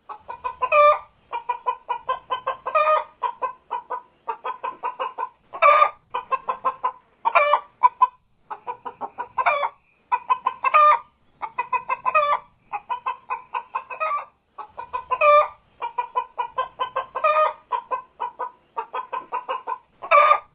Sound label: hen large